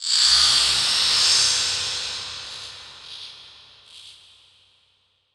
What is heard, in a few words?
Production Element Title Transition Demonic